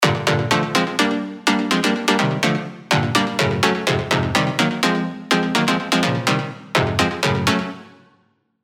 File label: serum 2 house stabs; Sangre Nueva; alonso saloon serum 2 house stabs